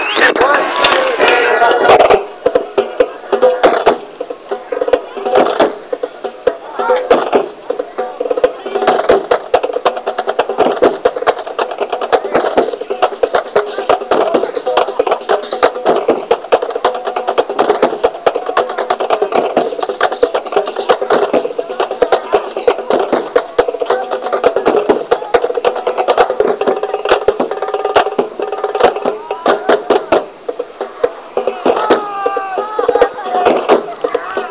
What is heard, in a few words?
cad CRACK, Percussion